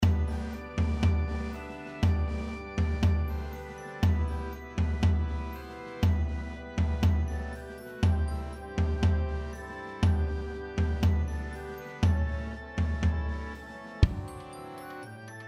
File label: surdo